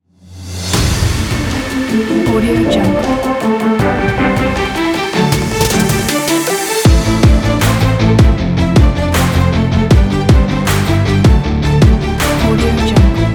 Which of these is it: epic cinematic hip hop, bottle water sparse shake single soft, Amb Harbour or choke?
epic cinematic hip hop